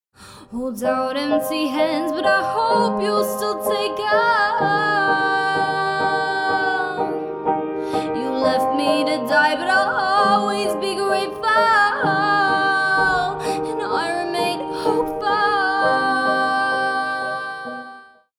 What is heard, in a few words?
Singer Piano Rough Mix